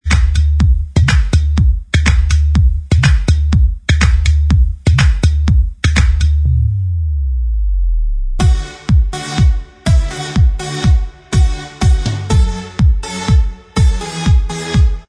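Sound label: remix, instrumental, track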